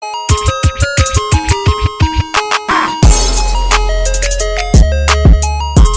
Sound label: ice cream truck remix